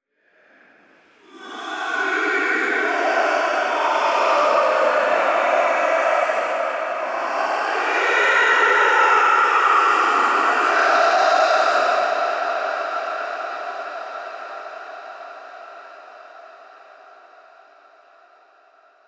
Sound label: crowd General Right; netent